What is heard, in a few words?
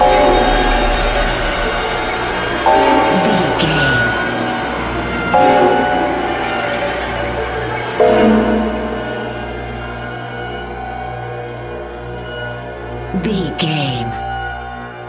lullaby modern horror